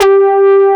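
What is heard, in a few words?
horn G2, synthesizer analog horn; AKAI S6000 CD-ROM - Volume 1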